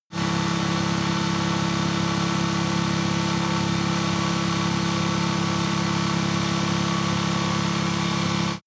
motorcycle engine medium low constant rpm ascending; motorraeder; verkehr transport